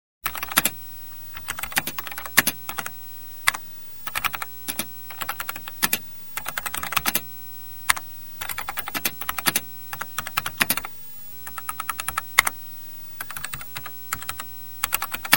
typing on a computer; SOUND EFFECTS1

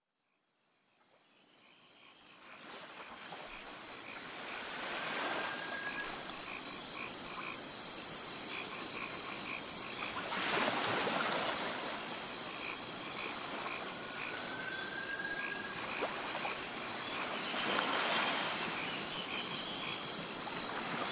Night Volcano Arenal Lake, Rainforest; naturescapes

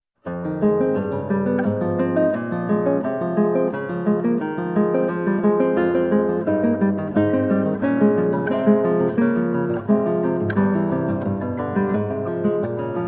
Prelude in F Minor

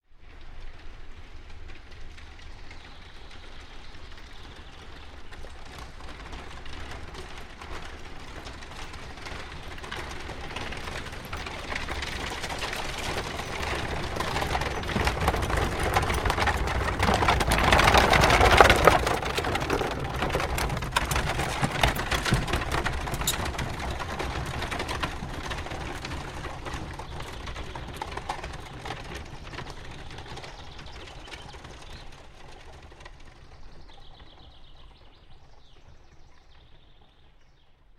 Horse Drawn Carriage By Fast; Horses Carriages and Traps; Hollywood Edge Historical Series

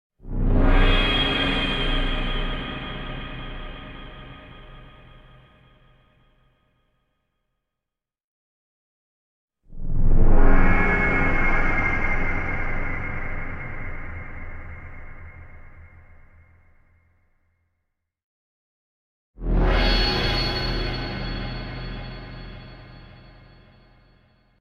Dramatic Metal Scrape with Synth Pad x3; Hollywood Edge Sound Designers Tool Kit

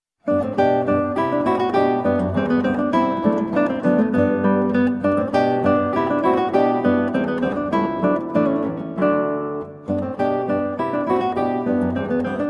Allegro from Partita 2 in G, Guitar

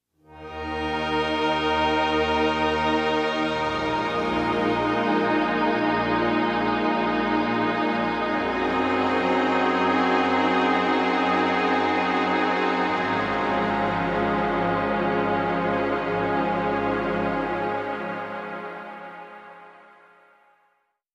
Big Chords; Beds

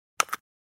Bone Break

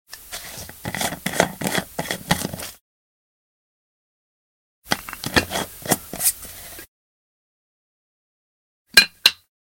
Unscrew Top, Screw On Top, Coffee Pot; 100 sound effects

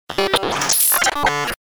April Break, Breakers & Transitioners; Best Of N2O Library

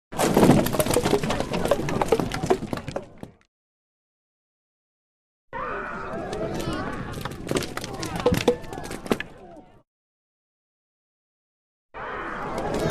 Arrows Hit Shields With Screams Salvo Of Arrows; Hollywood Edge Foley Sound Library

